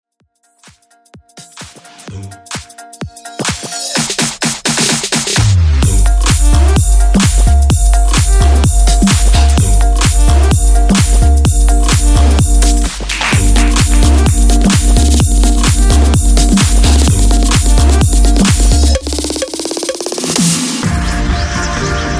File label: Xmas Bass House Banger